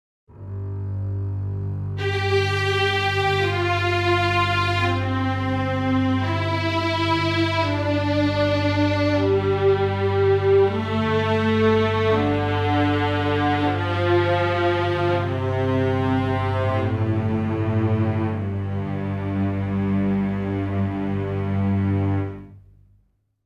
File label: String Ensemble; Native Instruments Symphony Series String Ensemble